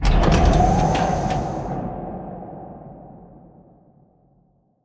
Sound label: Giant Floor Panel Arrive Start; Boomtown; Sideways Town; Titanfall 2